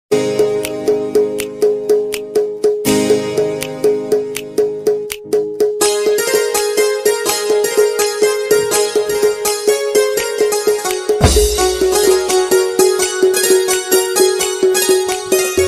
banjo lovers ringtone aagri koli banjo music ringtone koligeet ringtone